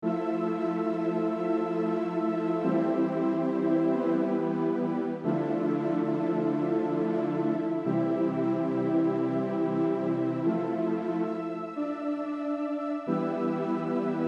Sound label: string part; zenology